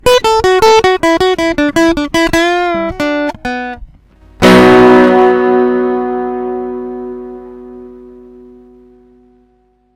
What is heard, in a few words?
ta-daah